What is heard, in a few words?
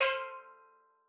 C4, STEELDRUMS; Xsample VOL-4